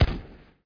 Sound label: Gun Fire; shadez